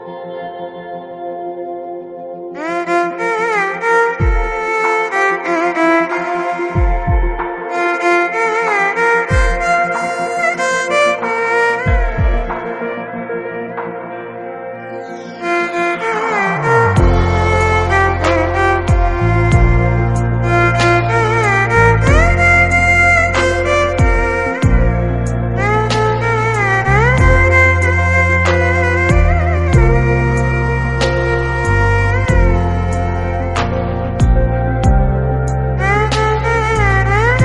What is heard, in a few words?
violin melody